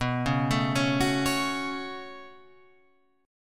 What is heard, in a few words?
B 7th Suspended 2nd down Guitar Open F, chord; B7sus2; arpeggiated; JGuitar